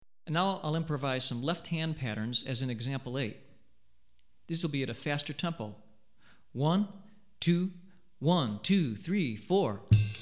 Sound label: Sikyi, Drumset Example, West African Rhythms For Drumset; Hartigan